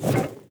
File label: tower necromancer basic Attack bolt Summon